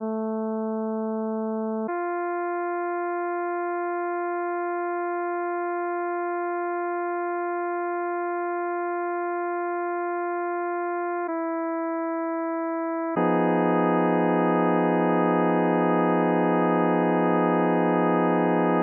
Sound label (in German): tristan gleichstufig langsam